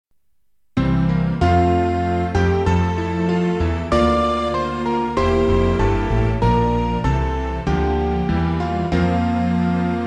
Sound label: Australia Our Land Vocal, SATB Choir And Concert Band